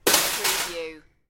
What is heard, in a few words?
Metal Crash Drop Debris Settle